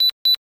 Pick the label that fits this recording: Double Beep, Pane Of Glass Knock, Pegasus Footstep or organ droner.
Double Beep